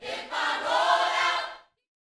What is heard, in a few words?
Vocal, Gospel, PHRASE; Spectrasonics